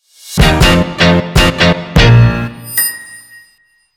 short happy audio logo short cartoony intro outro music